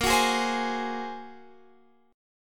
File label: Bb 7th Suspended 2nd Sharp 5th Bb, Guitar Half Step Down; Bb7sus2#5